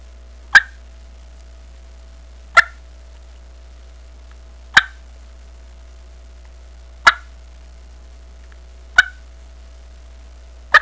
cluck, turkey

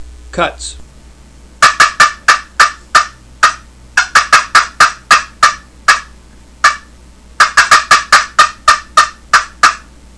super yelper pro auto cutts, turkey

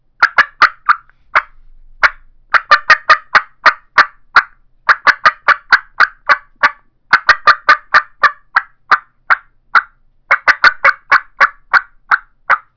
rio grande special mouth call cutts, turkey; Southland

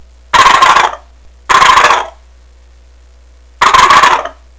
gobble; wolfe

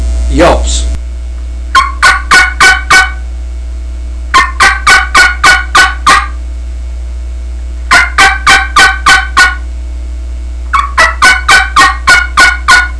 reliable yelps, turkey; Southland

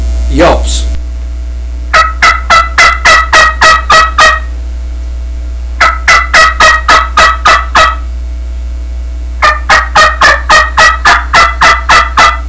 mt laurel keekee yelps, turkey; Southland Sounds

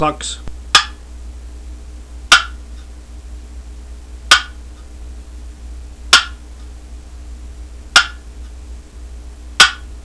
box clucks, turkey; canecreek

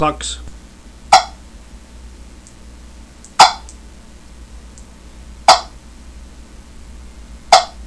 3pc clucks, turkey hunting; Grand Slam

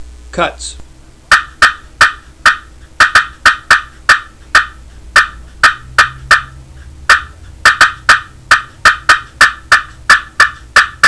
slate cutts, turkey; dixie

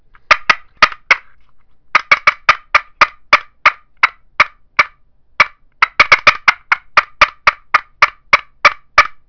pushpin box call cutts, turkey; dixie

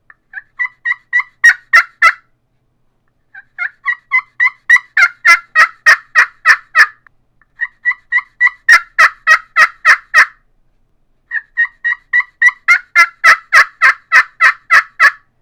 southland the green machine kee kee runs, turkey; Southland Sounds

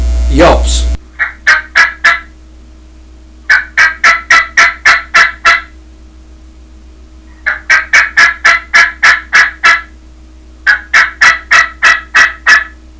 dev yelps, turkey; dixie

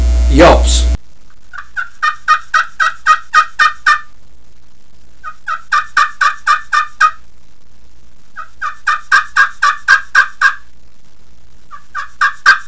slate yelps, turkey; dixie

